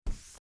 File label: moving item dragging; Nook BNTV250; ui